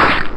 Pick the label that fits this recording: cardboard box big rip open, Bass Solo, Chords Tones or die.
die